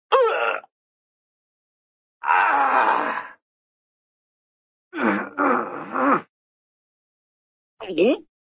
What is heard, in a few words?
grunt, cartoon short grunt